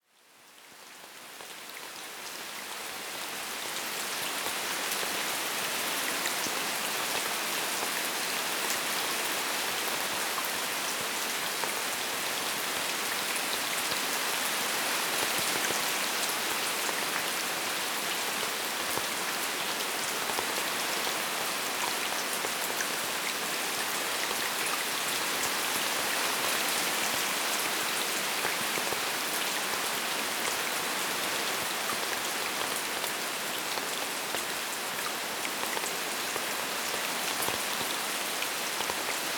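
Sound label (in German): Regengeräusche Schlafmusik, Regengeräusche gegen Tinnitus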